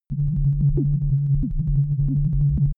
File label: low abstract synth; energie